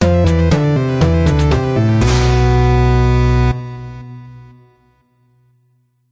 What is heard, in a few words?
8 bit game over arcade 80s simple alert notification game; epic stock media